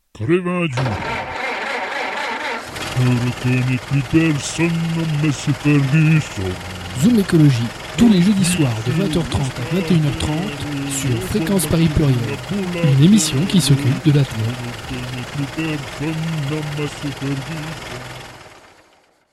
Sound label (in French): jingle; zoomecologie